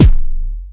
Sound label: dry kicks; Quasimidi Rave-o-lution 309